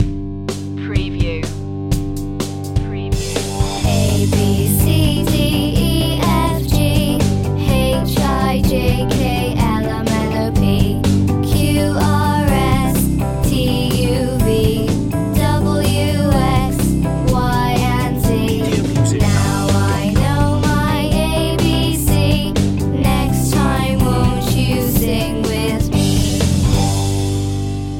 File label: the alphabet song children vocals short